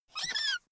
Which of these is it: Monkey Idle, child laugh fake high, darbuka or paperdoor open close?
child laugh fake high